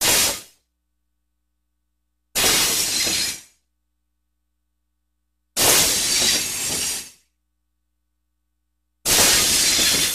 Loco Brakes, brakes

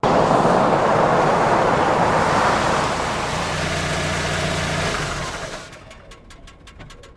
Jeep Cherokee 84 In Stop

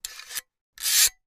caulk gun load; Caulking gun being loaded and unloaded. Tools, Hand Clicking, Woodworking Carpentry, Pumping

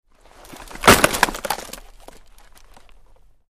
Football Block CU, football

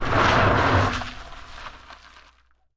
Car Tires Dirt Skid; tires on dirt

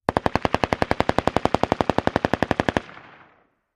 Mini 14 Machine; Rugar

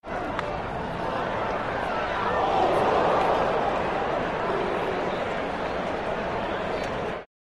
Arena Crowd Ohs; sports arena

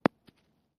Golf Ball Dropping

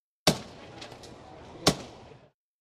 Stamp Ticket Passpor, airport

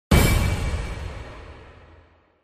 Impact HF Metallic Hi

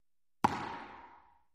Racquetball Bounces

